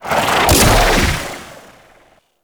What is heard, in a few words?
Ranger Cast C; Warframe